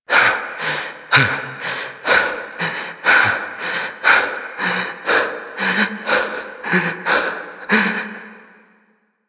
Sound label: Run Breathe Loop; Warframe; The New War; Veso; Quests